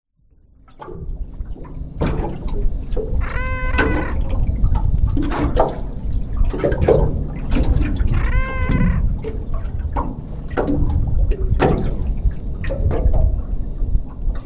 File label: Calling boat sloshing